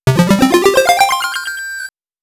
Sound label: Round Komplete Stage Over; Mortal Kombat 1 2; master system